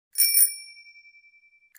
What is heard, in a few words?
bike